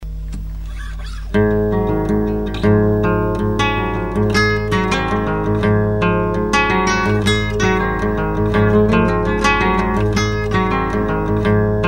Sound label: Guitar Jam